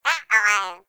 Flying Robot Voice; Quests; Veilbreaker; Ambience; Kahl Quest

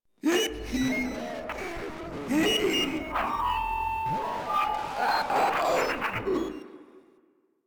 Proto Infested Runner Idle; Enemies; Vocals; Warframe

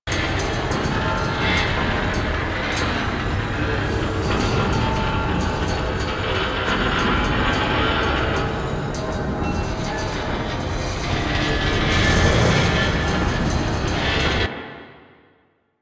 Spinning Metal Rings Loop; Ambience; Props; Warframe; Entrati

